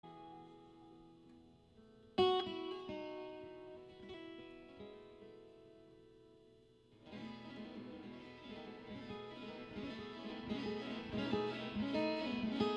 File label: Solo Acoustic